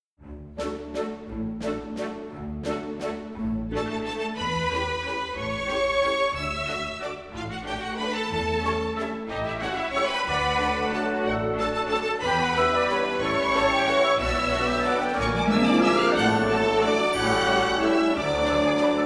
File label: Turns Male Solo, music-grade-3; 4 sets; From Diane And Acteon Pas De Deux